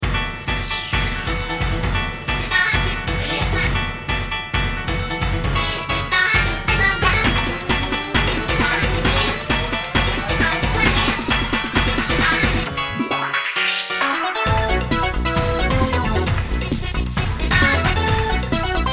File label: Additional Synths and Percussion; MKT